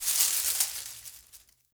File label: Ext Branch Rustle; Ambience